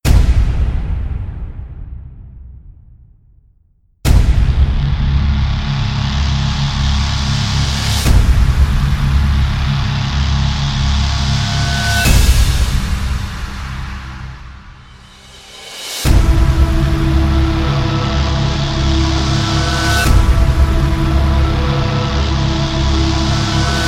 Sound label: trailer intros breached bass